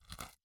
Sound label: bite small; angband